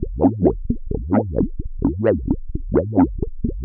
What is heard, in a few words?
Noise, LFO & Noise